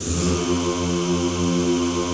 ZZZ F1, Classical Choir; Best Service; Extended Classical Choir

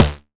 Kick, Drums; Bitkits

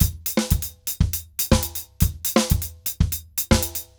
DRUMS, DRUMS SAMBA; Best Service ProSamples vol.14; AKAI

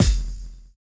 Kick, Grime, 138bpm; Zero-G Sound Sense Grime Scene; Construction Kits; Da Suspects

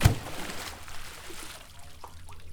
water slap

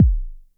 909 bass, Drums, Roland SH-09

